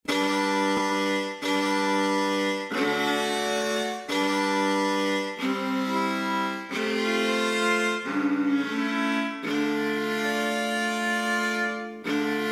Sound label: Modo de cantar sonetti, Lira Chords; Anonimo; how to improvise